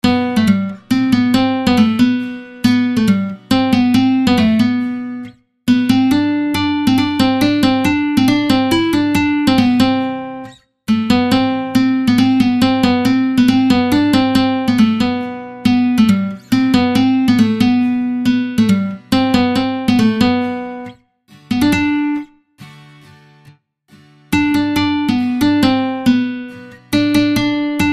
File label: guitar